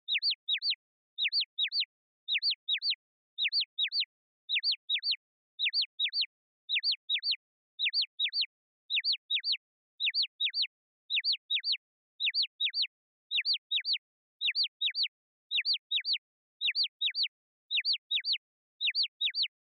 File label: Onoma Dizzy Slow Long; オノマトペ めまい; 遅 長